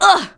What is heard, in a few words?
hurt; Genesis3D